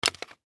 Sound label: Ski Goggles Drop; Skiing And Snowboarding; Sports